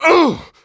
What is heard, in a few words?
Prisoner Dmg